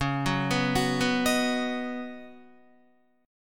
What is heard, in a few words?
Db 9th Suspended 4th Db Guitar; 8,9,11,11,11,11; 4F,4A,5C,5G,5C,6E; arpeggiated; chord shape; JGuitar